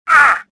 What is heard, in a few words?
pain; swamp2; Star Wars Jedi Knight II Jedi Outcast; XBOX